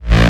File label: Bass B, Offbeat Bass; VEC1; Club